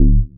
Sub Bass Stab D

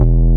Korg Poly 800 bs E3

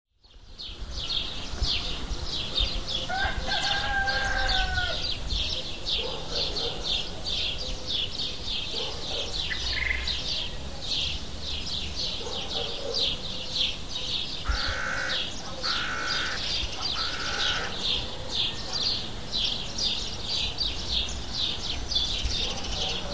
Cyprus Dawn Chorus

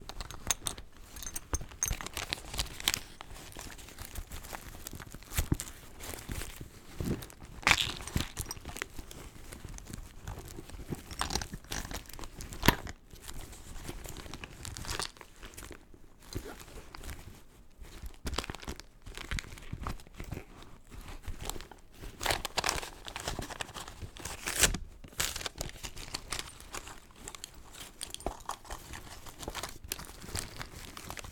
Rummaging Through Handbag

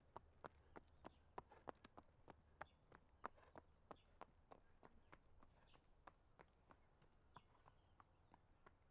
Horse Walking On Brick

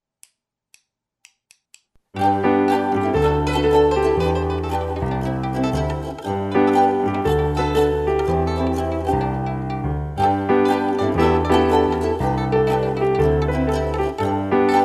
Piano

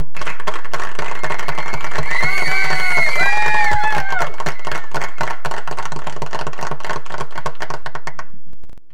Applause

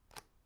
Take Headphones Out

